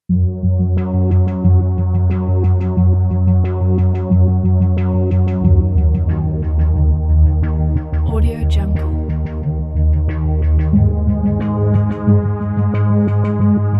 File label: soundscape pad